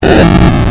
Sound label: explosion; supercobra